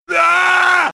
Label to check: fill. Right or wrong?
Wrong